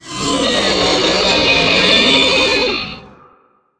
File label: spider guard mode